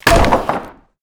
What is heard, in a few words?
board damage heavy; hammer; melee; tfa starwars melee weapons pack